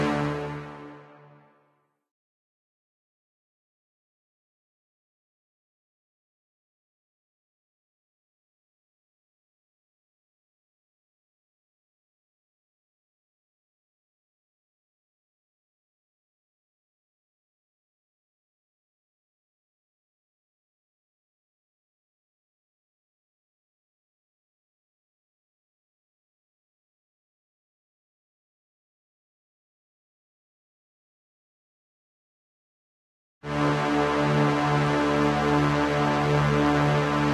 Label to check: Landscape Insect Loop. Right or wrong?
Wrong